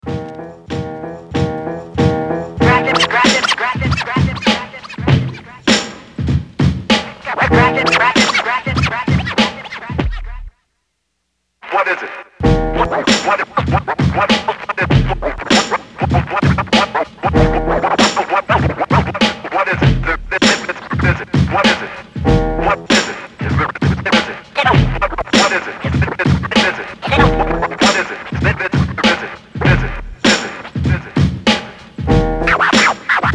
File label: Scratch Break